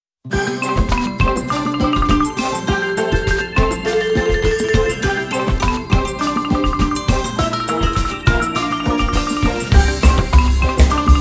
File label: Koto